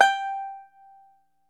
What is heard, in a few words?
ARCHTOP, Arch Top Banjo